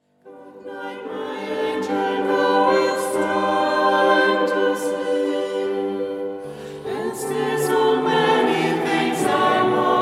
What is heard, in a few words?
Lullabye; kirchenmusik kaarst; choere